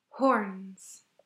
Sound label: horns